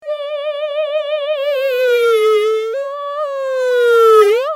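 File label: shakuhachi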